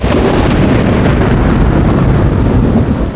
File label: thunder; Chase Hollywood Stunt Driver; XBOX